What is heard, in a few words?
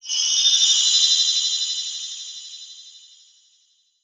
Title Transition Metallic; Production Element